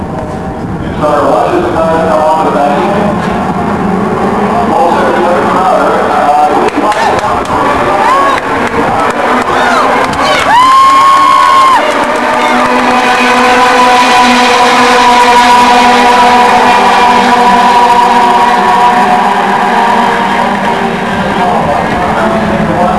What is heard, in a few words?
F355 challenge race start